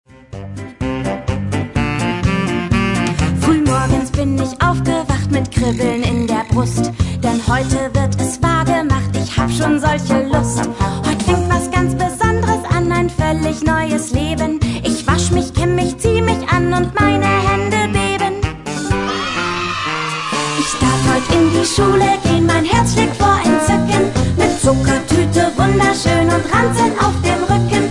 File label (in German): Schullied Gesang